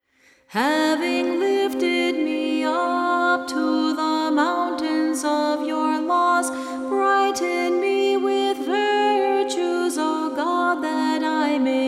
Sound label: antiphon